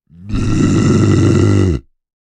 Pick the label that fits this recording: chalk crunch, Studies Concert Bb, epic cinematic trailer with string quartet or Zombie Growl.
Zombie Growl